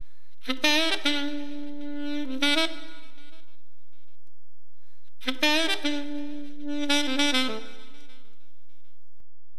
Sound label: ALTO, Wind; LiveAct Pool; AcidJazz Funk